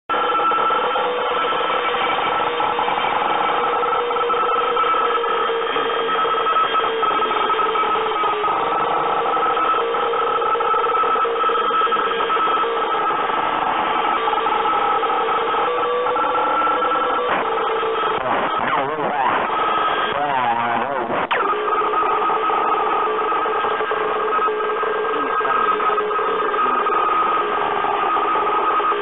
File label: BROKEN RADIO; MANGLE